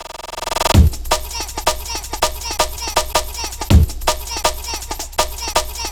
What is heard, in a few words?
162, breaks; M8